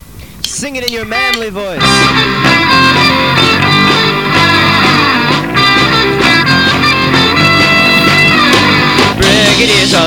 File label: Brigade Hymn chorus